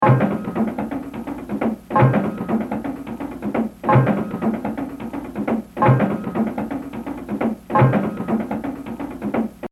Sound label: Exercise 1, Jazz Drumming - 100%; Baby Dodds; The Evolution of Jazz Drumming (Gottlieb)